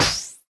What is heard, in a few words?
pump start; HeavenStudio; charging Chicken